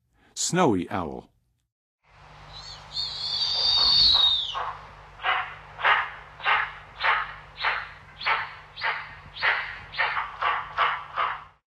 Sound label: Snowy Owl; N. America; Songs of Birds of North America; Western USA Songbirds